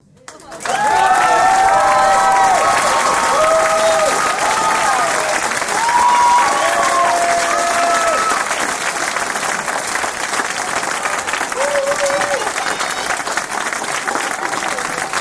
Audience Applause; beanstalk audio

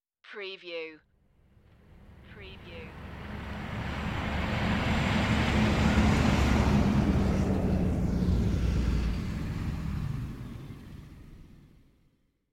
Large truck drive by; beanstalk audio

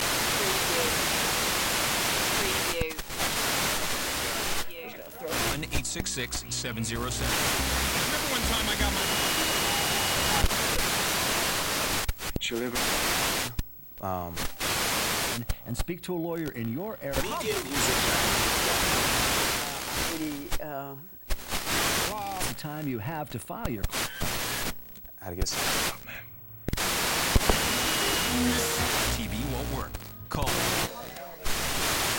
Quasar TV VHF Tuning Slow

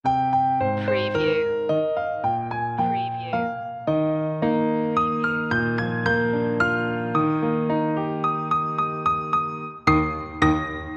piano; the holly and the ivy; media music now

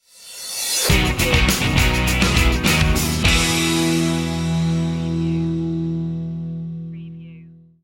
free and easy stinger

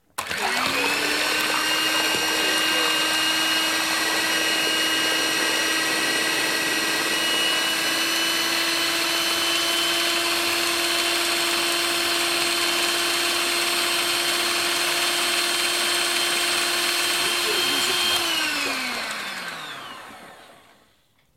Food Blender

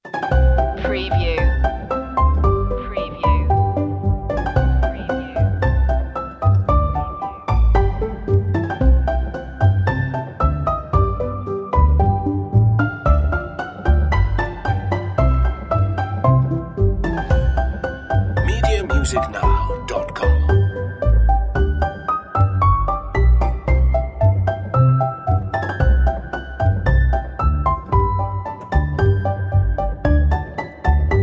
tippy toe less lead

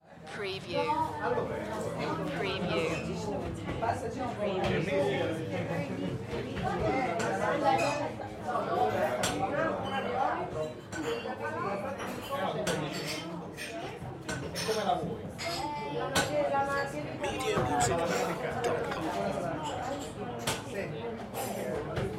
Italy Restaurant; Sound Effects Bible

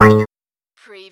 CARTOON SPROING

